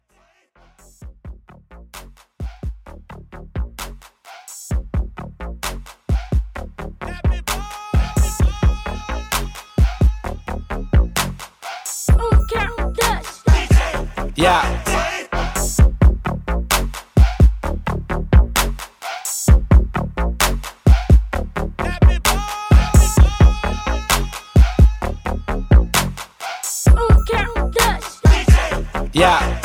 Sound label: Intro outro HipHop 130Bpm